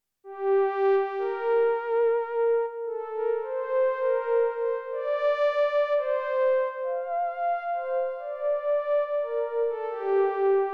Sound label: Lead